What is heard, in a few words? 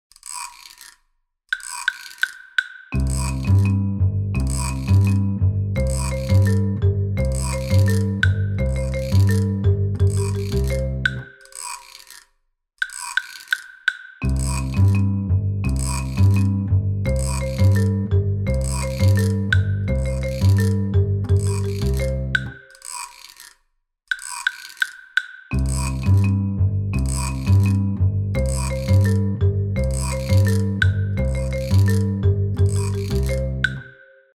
instr